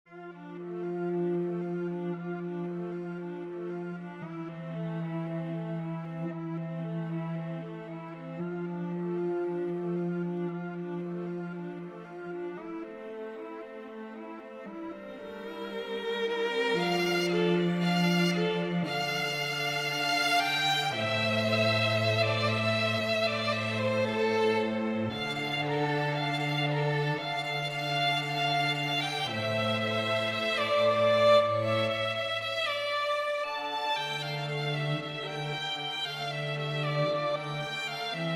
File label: String Quartet